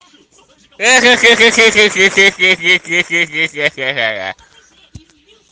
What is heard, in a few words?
hehehe